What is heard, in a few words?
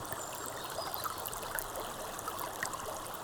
Waterway G Dark